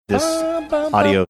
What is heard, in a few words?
singing sax sting; night court